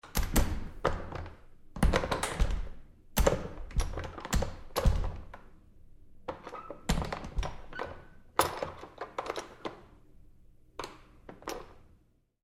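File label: Door Bathroom Stall Handling Close Up Lite Long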